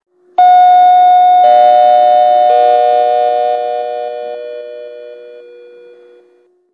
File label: chime; polestar2